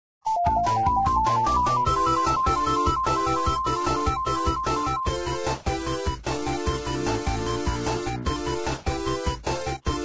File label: Keytar; Zomboid; Lifestyle